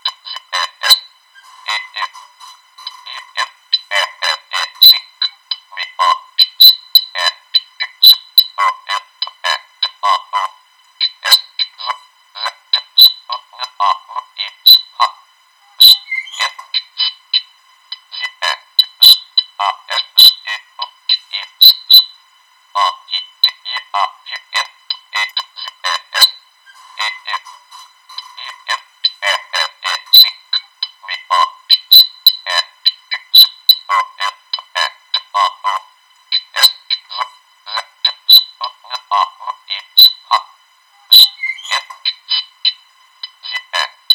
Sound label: echo highpass